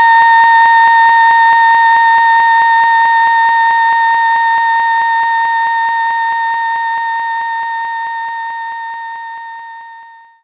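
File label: Solo Vox A#7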